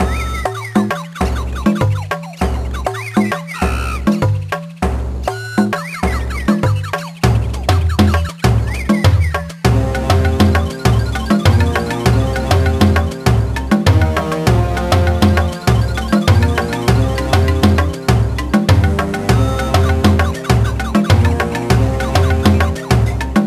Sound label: Jungle Treehouse